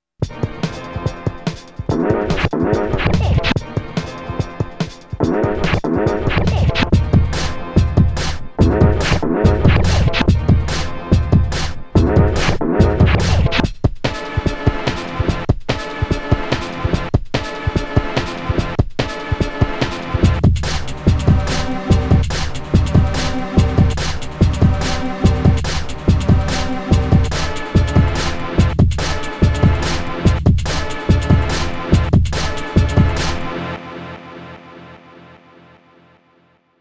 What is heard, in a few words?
drum fade